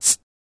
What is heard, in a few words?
Bottle Open Beer Air